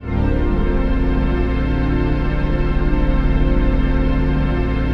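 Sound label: trance pad; Best Service; XX Large - Pads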